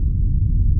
thrust; VIDEO GAMES; spacewar

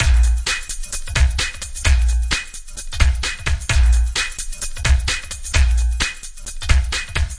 130 FM beat, drum loops and breaks

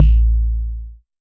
Jomox MBrane, drum machines; Jomox MBrane 11

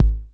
click kick; drums single hits

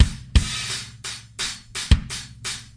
funki compression, 086 bpm, drum loop

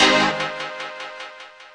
150 one hit synthecho

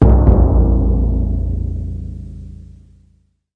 ominous kettle drum; Western and Latin Percussion; single hits

